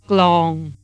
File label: Drum; thai